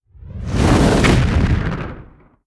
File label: FLAME EXPLOSION